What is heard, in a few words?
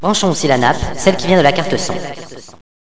kit; Villagina; Village; ECOLE